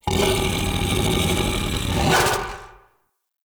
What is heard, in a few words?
Battle Dog Enemy Spotted; Animals; Vocals